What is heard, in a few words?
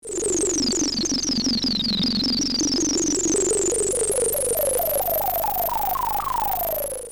Sci fi alien radio transmission